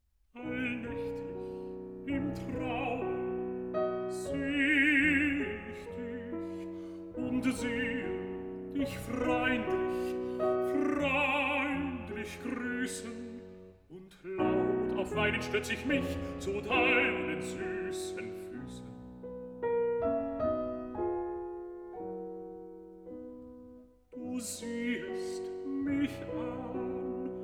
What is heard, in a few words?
song cycle for voice & piano; Dichterliebe; Schumann